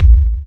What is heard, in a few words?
BDRUM; E-Lab; X Static Goldmine 2; akai